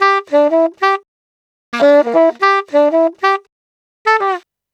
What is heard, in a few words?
Sax 100 bpm G, Saxaphone